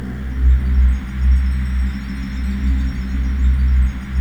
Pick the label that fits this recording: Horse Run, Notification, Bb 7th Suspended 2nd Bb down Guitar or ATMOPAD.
ATMOPAD